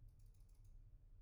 mouse; IEEE AASP

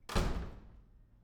door slam; IEEE AASP

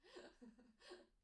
laughter; IEEE AASP

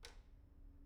pendrop; IEEE AASP

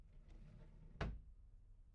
drawer; IEEE AASP

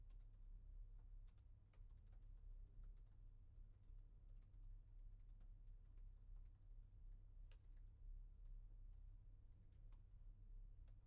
keyboard; IEEE AASP

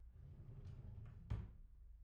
drawer; IEEE AASP

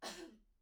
cough; IEEE AASP

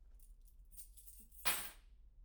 keys; IEEE AASP

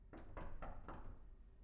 knock; IEEE AASP

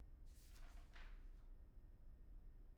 pageturn; IEEE AASP

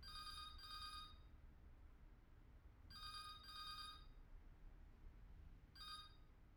phone; IEEE AASP

